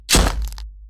Monster Step Backleg

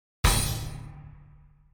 Big Hit

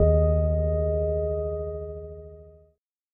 Moog Gong Eb

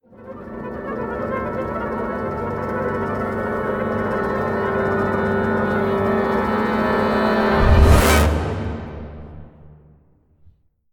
Creepy Tension Rise Build Up

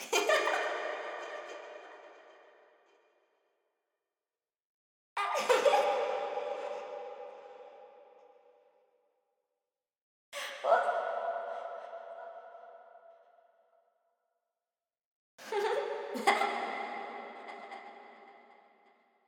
Creepy Girl Laugh

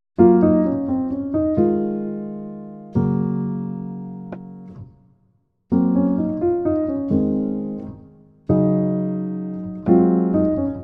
Lo Fi Jazz Piano Loop

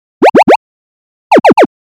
Loud Electronic Chirps

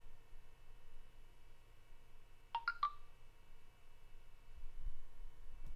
Notification

